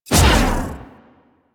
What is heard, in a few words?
Pulse Laser Shot; Railjack